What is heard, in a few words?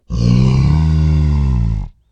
Slow Troll Whirrs